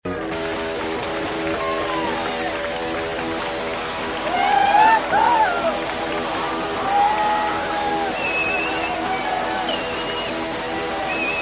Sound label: acoustik punk